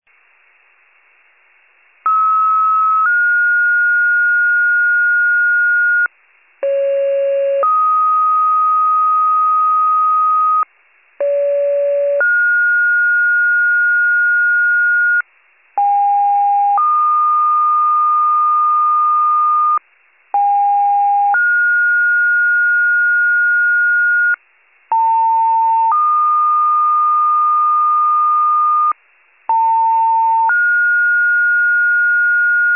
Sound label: fire, pager alert